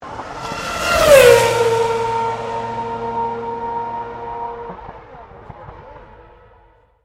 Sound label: CAR RACING F1 PASSING, F1 Grand Prix practise; Cars; Vehicles